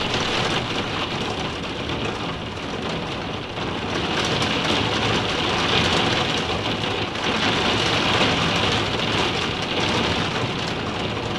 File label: rain on attic window; Yet more rain dripping on the same attic window.